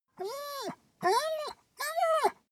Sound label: dog whimper; natur-tiere; hunde